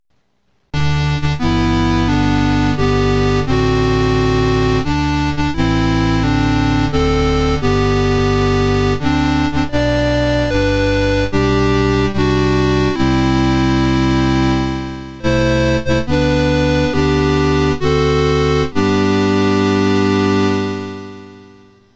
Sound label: sid Happy Birthday